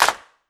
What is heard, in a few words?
clap one shot g sharp key; percussion; single hits one shots